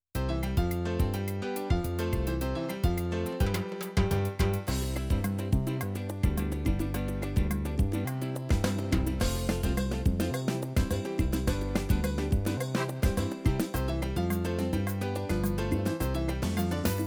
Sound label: Salsa